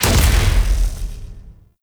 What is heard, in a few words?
Blitz Activate; Warframe; Eximus